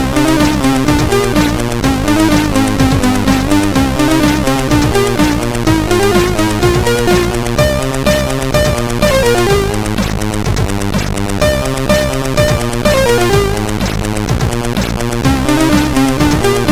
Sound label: Arkanoid Tune